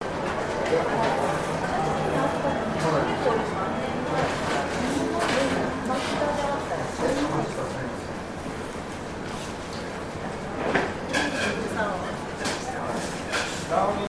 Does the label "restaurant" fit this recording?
Yes